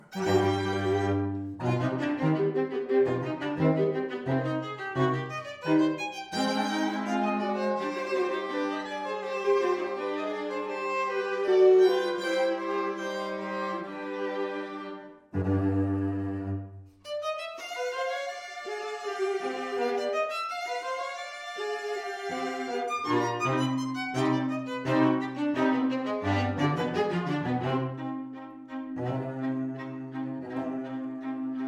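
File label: quartet; clapisson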